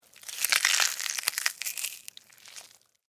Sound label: Flesh Rip Slow; Horror; Blood and Guts (Sound Effects:Horror)